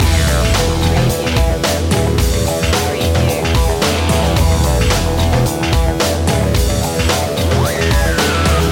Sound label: beat loop